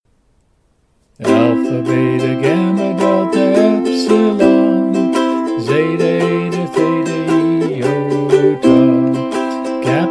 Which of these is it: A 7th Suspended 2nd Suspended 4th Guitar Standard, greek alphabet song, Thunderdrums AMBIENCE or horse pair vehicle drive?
greek alphabet song